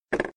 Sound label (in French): son menu lateral